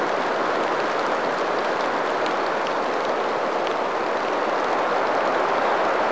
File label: Rain; ImpGal2; groundwar